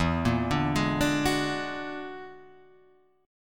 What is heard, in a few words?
E 9th Flat 5th E down; E9b5 arpeggiated; JGuitar